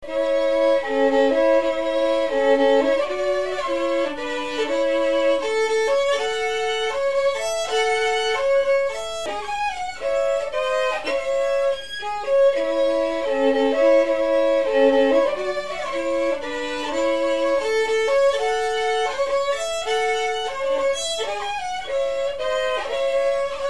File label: waltz